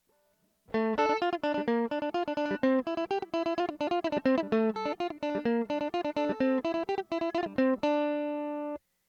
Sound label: mandolin comparison electric srm